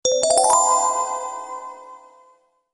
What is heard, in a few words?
Cellular Bell Alert